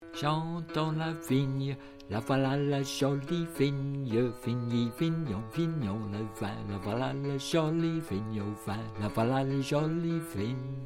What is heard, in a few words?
bass